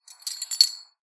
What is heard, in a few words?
coins handling; soundscrate